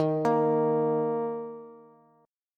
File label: Eb 5th, Bass; x,x,13,15; JGuitar